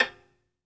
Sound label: Synclavier Strings, SOLO VI; ILIO